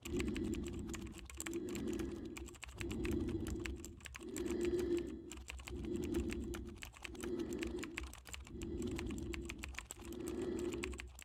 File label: Darkness Loop; Black Hat